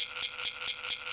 Guiro Up

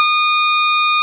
Fretless Bass Db4

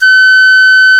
Piccolo A#4

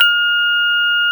Music Box C5

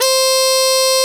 Harmon Mute E3

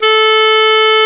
English Horn B2

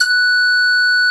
Siku Db5

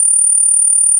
Solo Vox C7

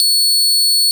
Sitar Eb6

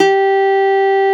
N Guitar B3

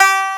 Banjo B2

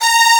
Strings C3

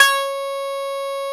Banjo F3

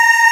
Aahs C4

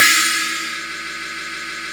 China Crash Cymbal

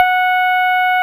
EP E3

